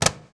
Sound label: CAJON; Univers Sons - Raricussions